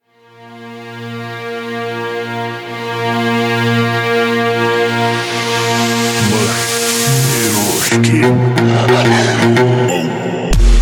39-44Hz Screwed Low Bass

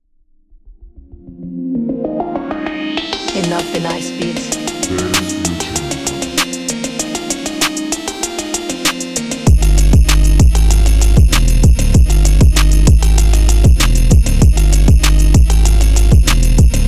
Bass phonk

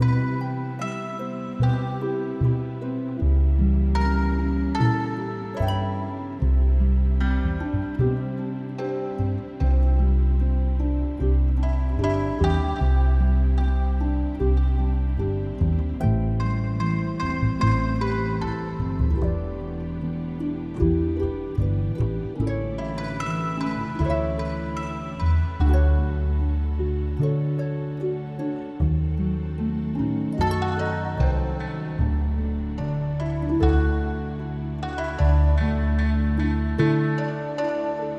Relaxing Asian Spa Music

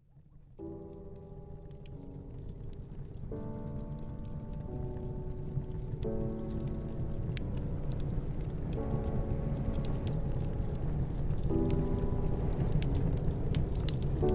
Relaxing Music with Cozy Ambience; MINECRAFT

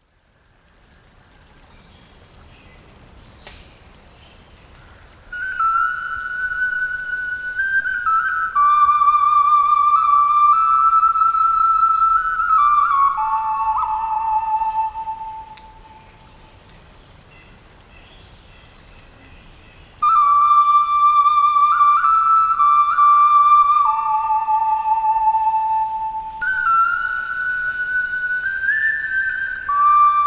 Relaxing SPA Music Sounds of Nature White Noise

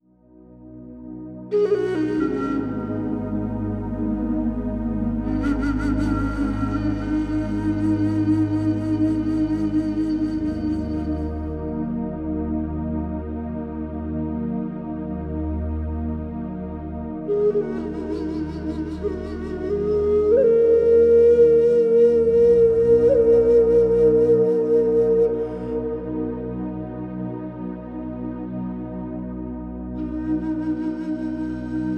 Zen Meditate Spa Relaxation

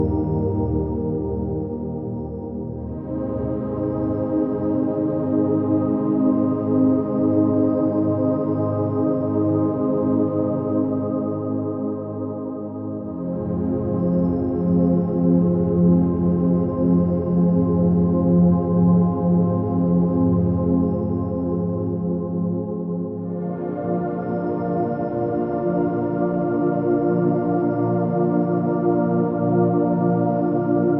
528Hz; Love Frequency

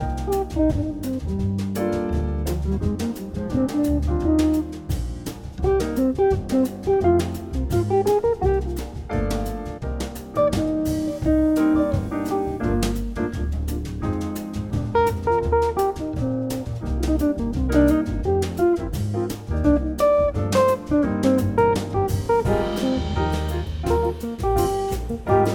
Bossa Band

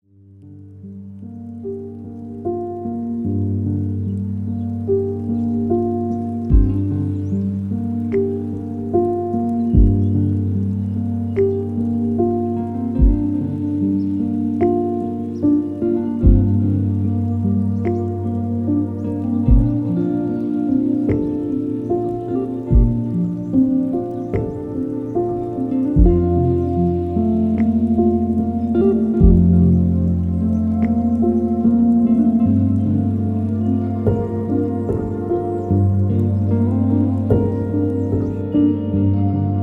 Lofi

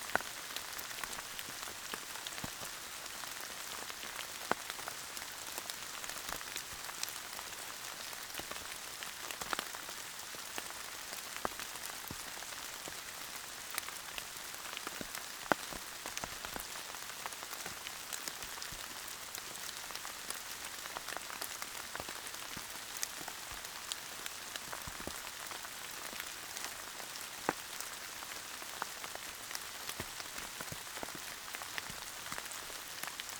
White Noise, Rain

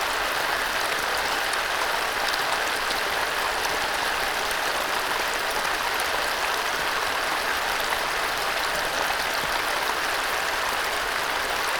Tibetan Singing Bowls

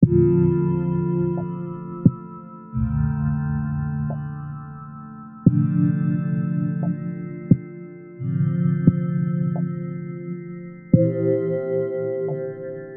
Binaural hertz; Resonance Focus

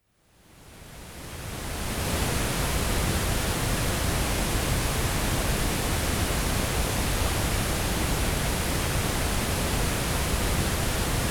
Baby Sleep White Noise

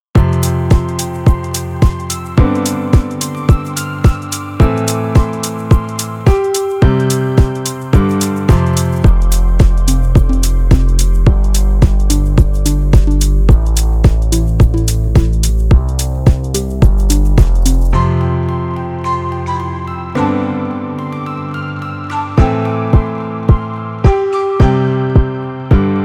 Happy Background Deep House

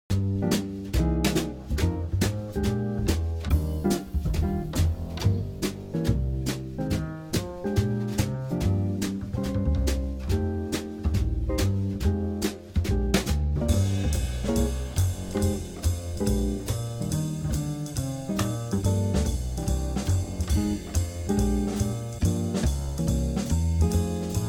Classic Restaurant Jazz

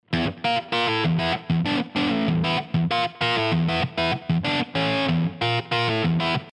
Fender Japan ST-43 M Middle bridge drive

